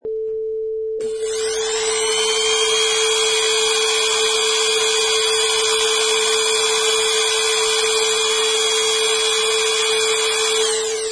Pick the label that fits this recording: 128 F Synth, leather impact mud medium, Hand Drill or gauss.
Hand Drill